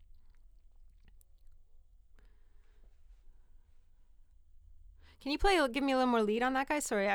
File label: Ld VOX Double